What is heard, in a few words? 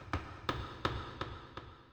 bottle, ethno, 125